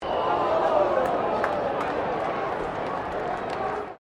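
crowd 40K Melbourne Cricket Ground aww low, Cricket at Melbourne Cricket Ground; Crowds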